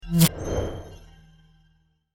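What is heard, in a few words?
magic fairy sounds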